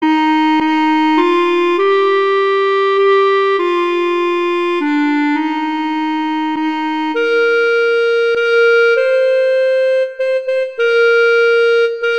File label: The Friendly Beasts; Christmas Beginners